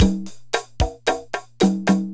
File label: machine; SGI O2 Out of Box Experience; jungle Island; Cyber Gourds